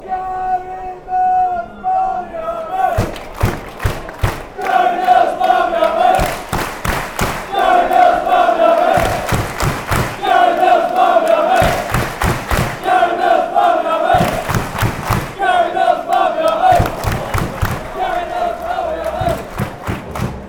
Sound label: barmy army; gary mills; york